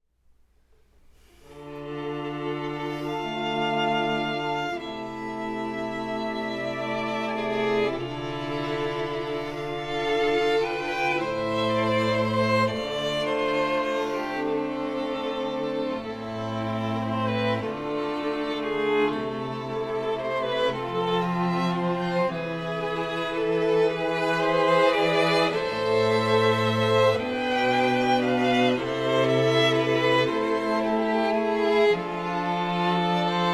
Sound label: string quartet